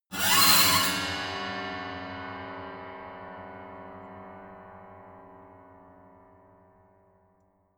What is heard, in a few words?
piano bow string broken high